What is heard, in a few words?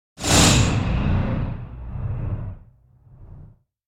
Whoosh Sharp Flying Off; Hollywood Edge; sound designer's toolkit v2